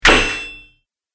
Sword Hits Armor; combat

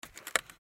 Picture Frame Move Latch; Latches (Sound Effects:Objects); Foley; Blastwave

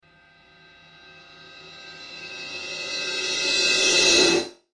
Ascend Swell Reverse Cymbal; Ascend Swell Long Cymbal Reverse; Blastwave

